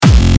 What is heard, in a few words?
frenchcore